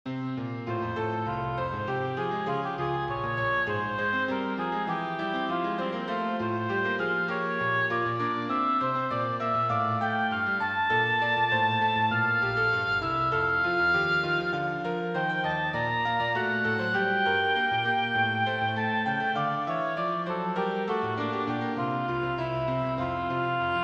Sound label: oboe; Oboe Sonatina; Third Movement